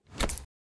ui submenu close

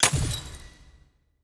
block destruction glass

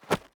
mob grab

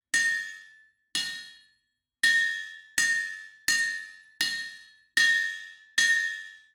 ambience room forge working layer

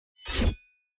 crusader strike sword

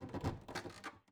ambience room lair work layer

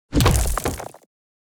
building upgrade build

